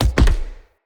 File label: Dendrit Bow Shot Punch, Bows; Weapons; Player